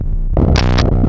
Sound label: Raw Glitch